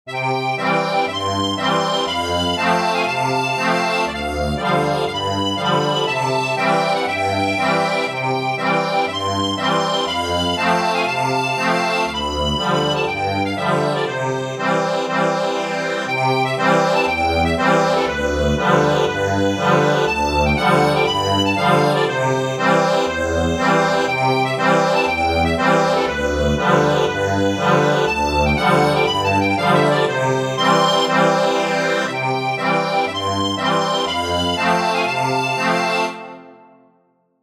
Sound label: accordion